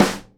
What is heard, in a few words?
SNARE, Live Snares